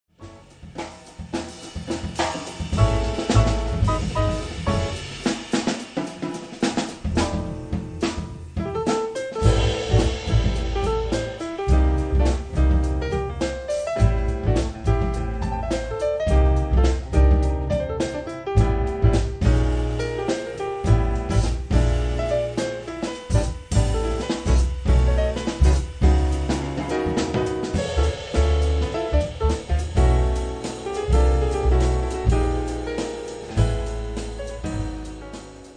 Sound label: trio